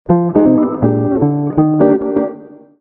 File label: jazz